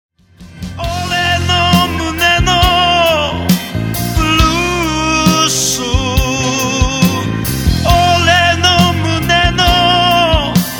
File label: blues